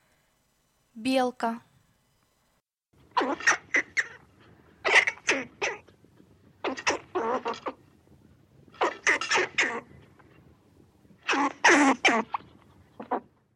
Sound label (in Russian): Белка